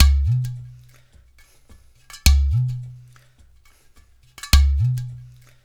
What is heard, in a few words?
percussion loop; InVision Interactive; Percussion Slam; ZOMBIE